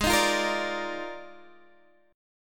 D sharp Major 7th Suspended 2nd Suspended 4th G sharp, chord